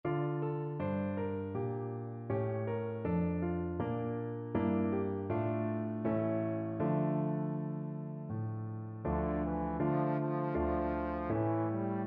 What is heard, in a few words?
oyfn pripetchik, trombone